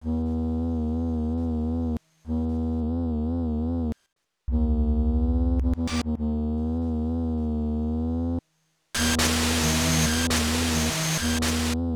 syntest; SootSound Demo; MSX2

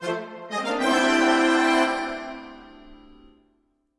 Stinger Challenge Win; Sports Story

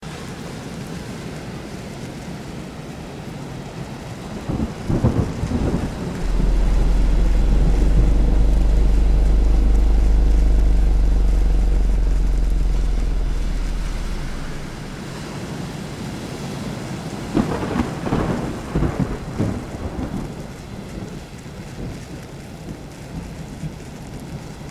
ambiance molten; Otems Defiance

